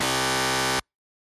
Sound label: Quiz Wrong Buzzer